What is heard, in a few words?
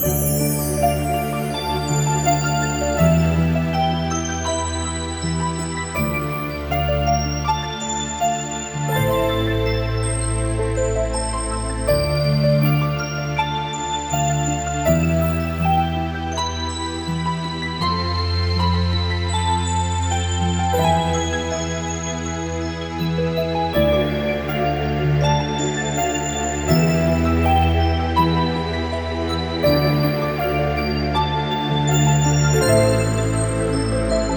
Might work under a game title/menu, cut-scene or transition.; The Magic Box